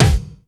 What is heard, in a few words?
RY30 KIK; Hollywood Edge; The Big Bad Beatmachine